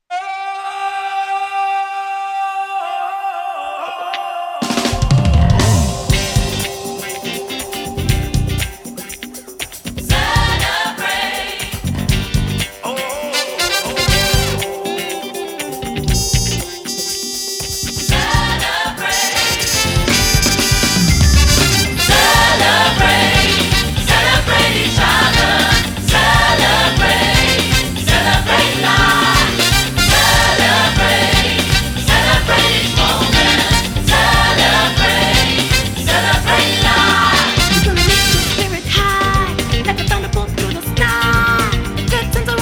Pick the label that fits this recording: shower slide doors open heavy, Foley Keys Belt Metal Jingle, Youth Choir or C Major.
Youth Choir